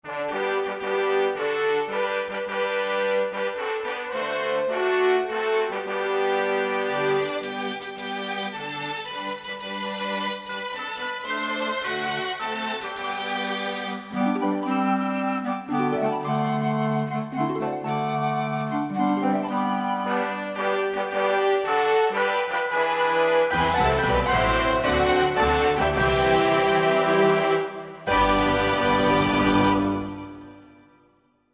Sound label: o christmas tree